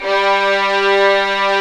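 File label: violins, fff; Roland - String Master Series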